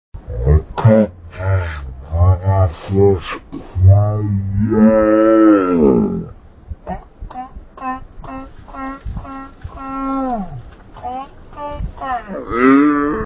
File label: squirrel; vapesquared